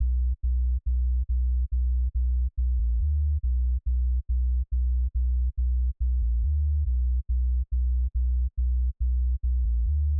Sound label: Sub Bass; dub; Full Dub - Dream World [Sample Pack]; ODGPROD